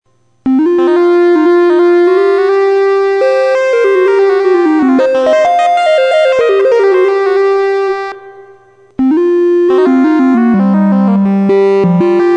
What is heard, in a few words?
trig gate cv delay; PSIM-1